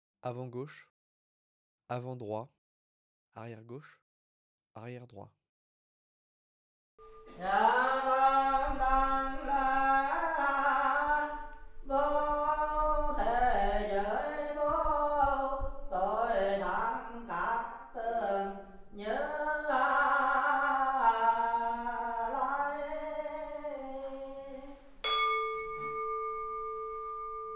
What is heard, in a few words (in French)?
AMBIANCE MARSEILLE TEMPLE BOUDDHISTE MESSE CHANTS PRIERES PERCUSSIONS; AMBIANCE 4.0